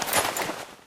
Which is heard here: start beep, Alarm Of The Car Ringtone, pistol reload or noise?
pistol reload